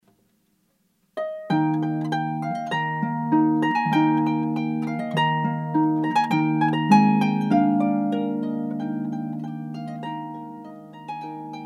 Harpist; Boston

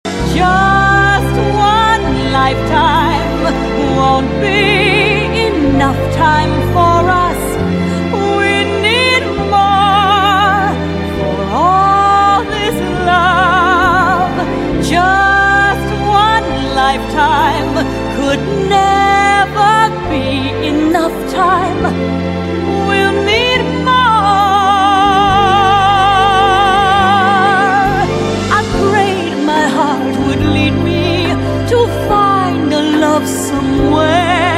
Pop Singer

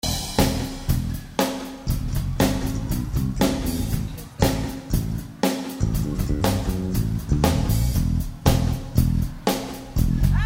Wedding Band; Boston

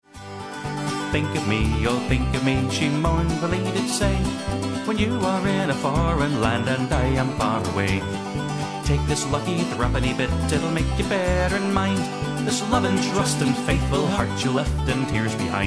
Irish Band; Orlando

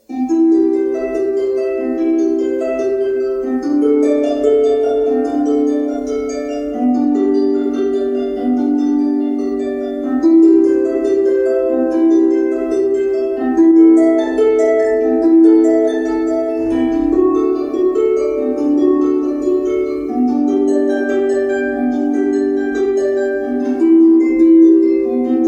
Harpist; Omaha

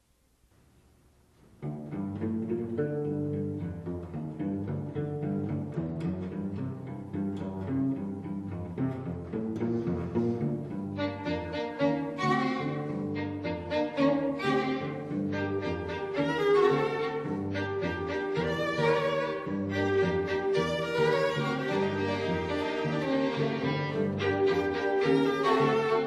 String Quartet; Baltimore; Baltimore String Quartet 1; This Baltimore String Quartet is a professional string ensemble providing chamber music for wedding ceremonies

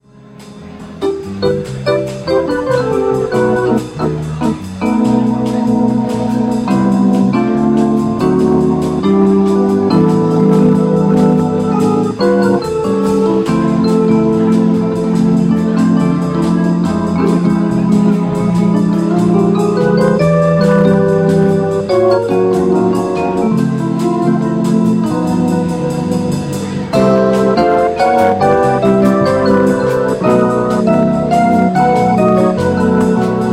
Jazz Band

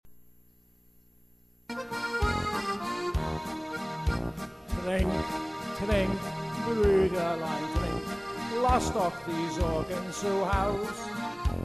German Band

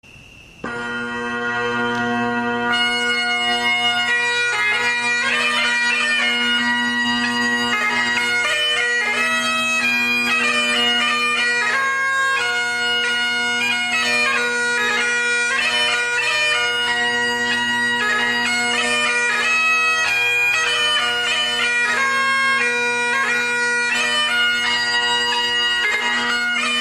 Bagpiper; Baltimore; bagpipes

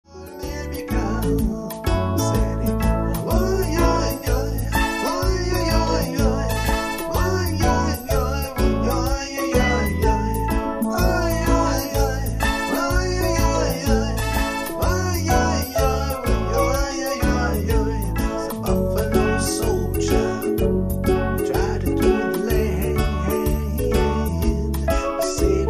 Steel Drummer; Los Angeles Steel Drummer

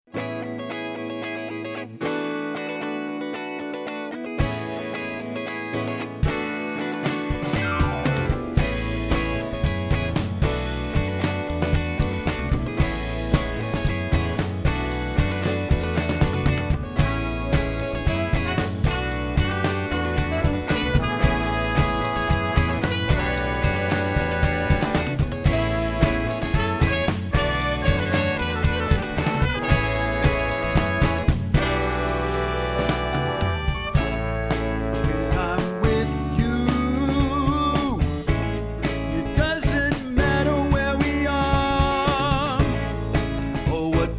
Cover Band

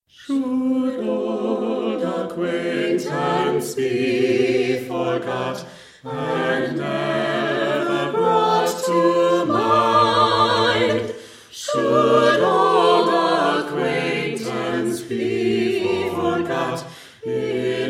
Carolers; Minneapolis

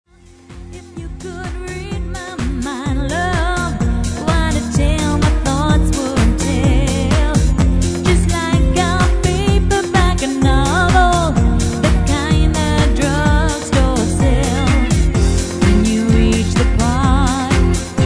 Party Band; Sydney

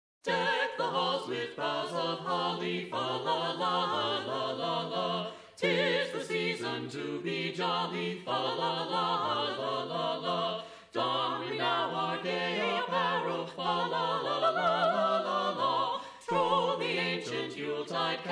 Carolers Deck the Halls; Boston

